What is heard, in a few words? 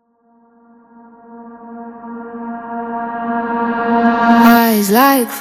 reverse reverb swell